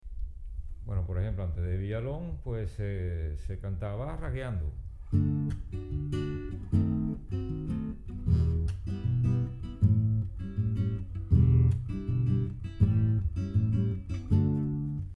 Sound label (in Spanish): rasgueando